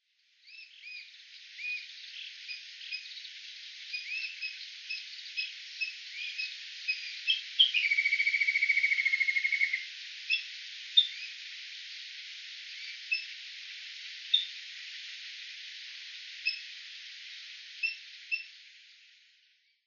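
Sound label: Little Grebe, watarase; kaitsuburi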